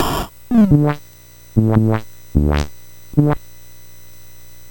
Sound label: Ducks Ahoy, MOS6581R4